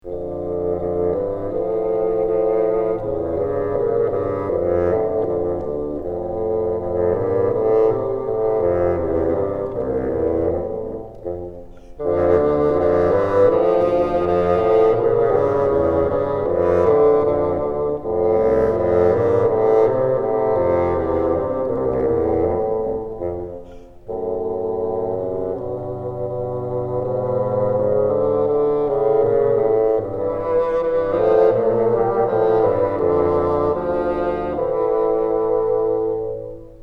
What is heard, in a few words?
together, bassoon